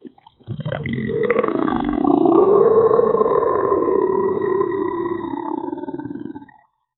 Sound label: dinosaur coming close, prehistoric